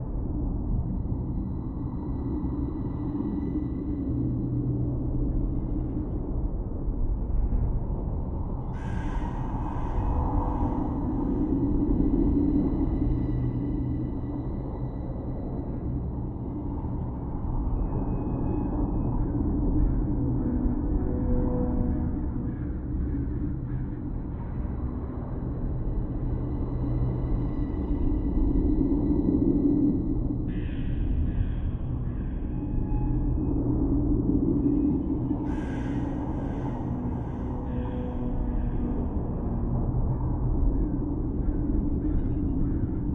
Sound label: necromancer, ambient sounds; Heroes of Holdem